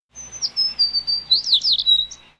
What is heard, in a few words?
Sparrow